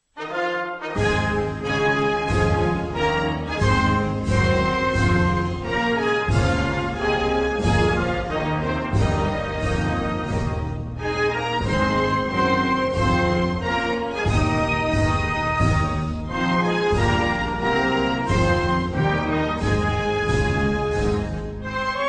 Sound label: National anthem of Vietnam; Himno nacional de Vietnam